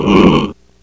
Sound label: oink; allegiance